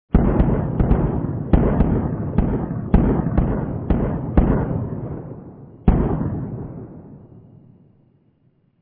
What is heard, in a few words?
dist explosion; cstrike